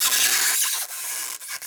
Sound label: atmo; Techno Trance Essentials; FX & ATMO